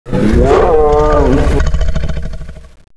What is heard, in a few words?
roar; gearbox; renesaur